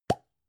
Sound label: gum plastic box open hard